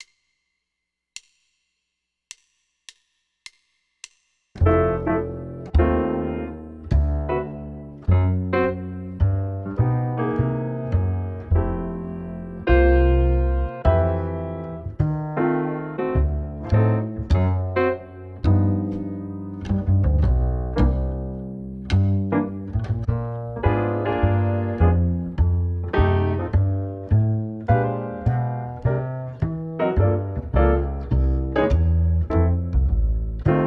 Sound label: med swing 104 drums, jazz; LMEA All-State Jazz